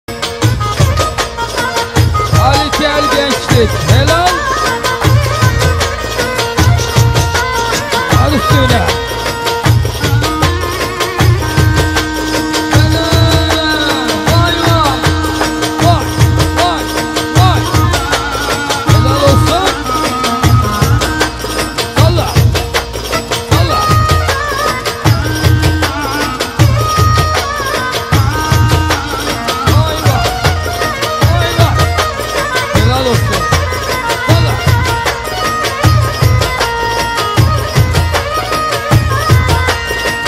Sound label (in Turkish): ciftetelli